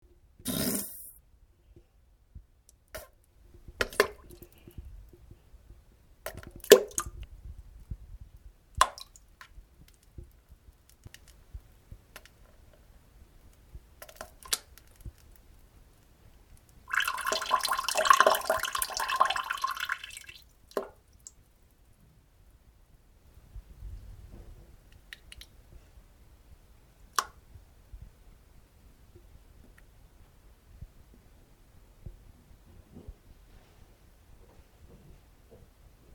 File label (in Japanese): なんべんおなら ぶぷぅ ぽちゃ おしっこと一緒にちょっとなんべんぽちゃ音